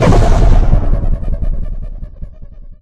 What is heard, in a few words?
Down; Life of a Naomi